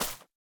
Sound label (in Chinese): 藤蔓 破坏声